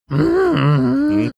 growling; like marge simpson

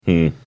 hmm

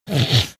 snorting

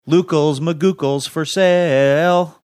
singing for sale

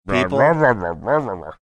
imitating sound typing mouth full

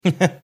heh heh

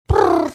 trilling brrrrrrrrrrrr

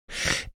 death rattle

